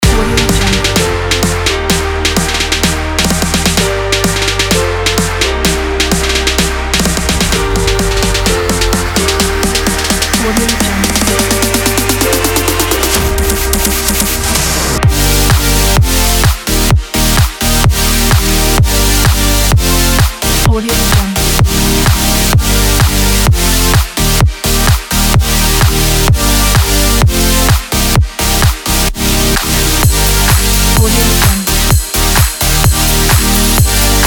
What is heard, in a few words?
the trance